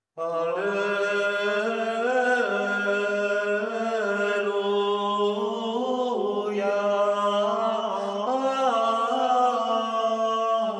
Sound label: Alleluja Schola Cantorum; Gymevensis; holy week practice; easter vigil